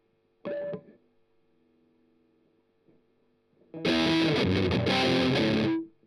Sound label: Strum Octav